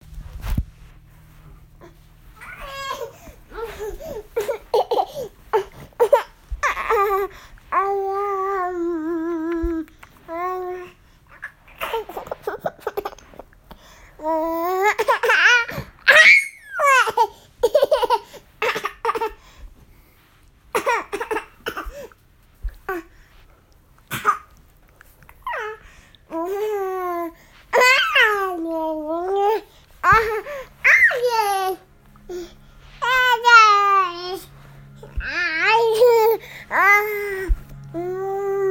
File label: laugh